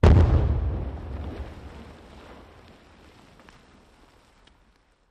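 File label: Underwater Explo Med, explosion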